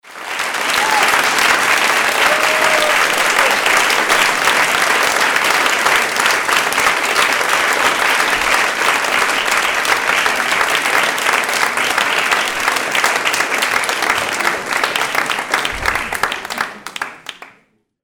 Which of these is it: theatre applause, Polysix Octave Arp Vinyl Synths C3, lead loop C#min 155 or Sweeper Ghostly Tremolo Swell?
theatre applause